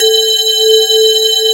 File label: METAL BAZZ